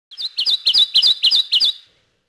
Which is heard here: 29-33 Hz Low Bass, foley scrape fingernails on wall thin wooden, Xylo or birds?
birds